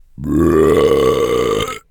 burp; shweets sim; braixen

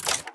open; shweets sim

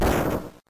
stomp; shweets sim; snivy

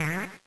bounce; braixen; shweets-sim

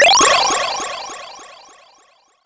cheats Activated; shweets sim

